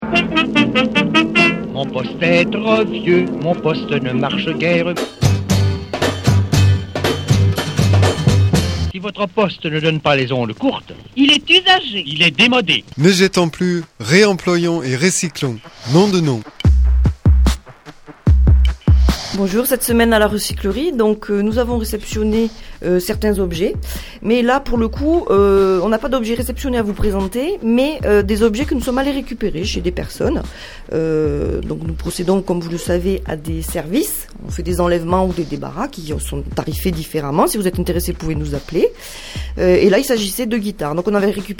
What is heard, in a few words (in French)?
Guitare; Recyclo